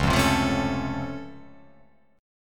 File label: C sharp Augmented Major 7th down, guitar chord